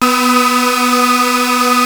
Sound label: Prophet 5 B4; Hollywood Edge - Giorgio Moroder Rare Synthesizer Collection